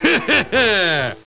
laugh

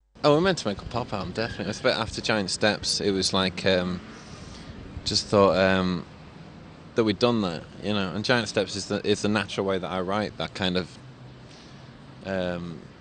boo

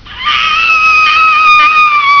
scream

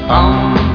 ommm

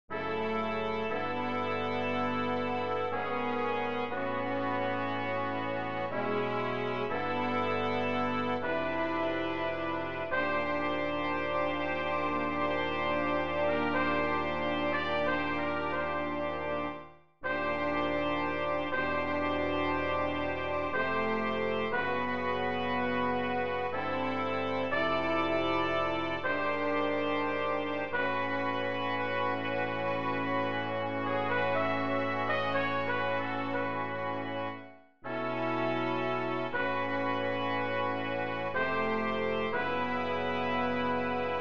myriad 5 chorale; for brass quintet and percussion

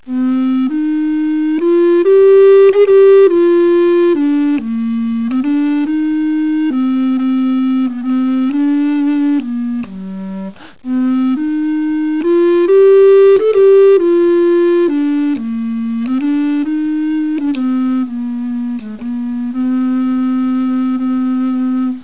recorder bass

